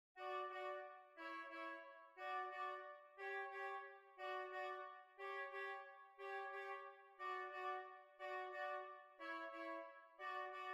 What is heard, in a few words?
Waltz; Lord St Vincents Waltz